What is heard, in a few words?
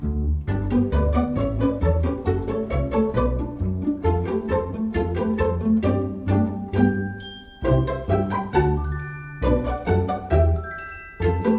music win level; Candy Crush Friends